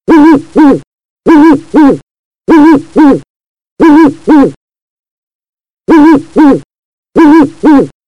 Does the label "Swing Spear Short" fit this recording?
No